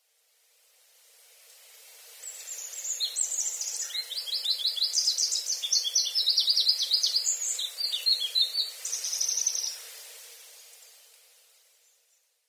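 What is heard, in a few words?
Pallas's Leaf Warbler, sakata; karafutomushikuis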